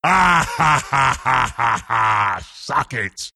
laugh; bristleback

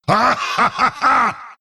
laugh; lich

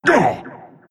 pain; doom bringer

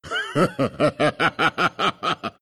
laugh; night stalker

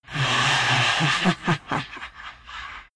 laugh; vengefulspirit

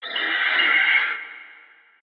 phoenix bird denied

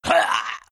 pain; lone druid

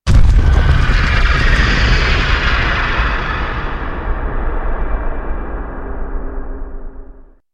Pit of Malice; Underlord

